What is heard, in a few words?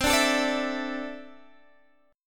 E 7th Suspended 4th Sharp 5th C